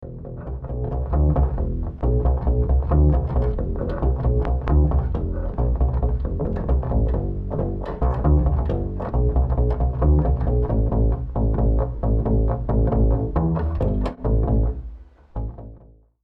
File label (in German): BASS SOLO